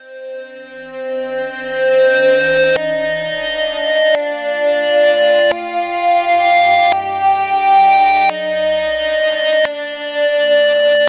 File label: Vibes, Drum n Bass; KJ Sawka; Drum n Bass & Beyond